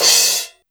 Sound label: crash; East Collexion; Drum 1 Dry